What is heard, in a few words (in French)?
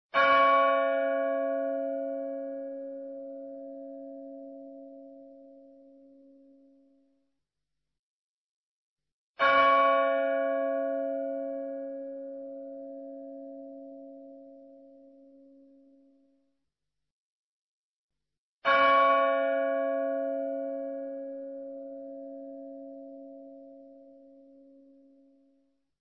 cloche